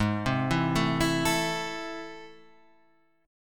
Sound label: A Minor Ab, guitar; Am/Ab arpeggiated; JGuitar